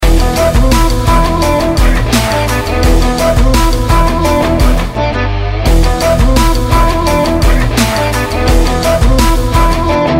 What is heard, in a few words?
theme music; tamil; vivegam